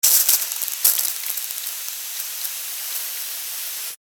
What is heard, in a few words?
Pesticide Can Spray, Extermination, Pesticide, Can, Spray; BlastWave